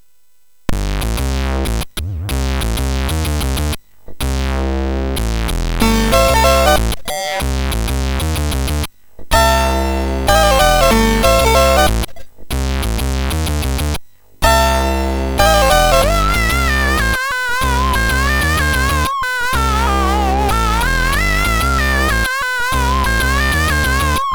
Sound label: Music Demo Version, MOS6581R3; hvsc